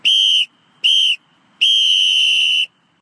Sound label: game over whistle; Kids Games; DribbleKingsFootball